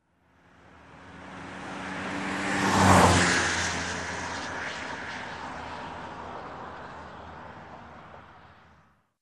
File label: Car passing by